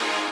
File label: lead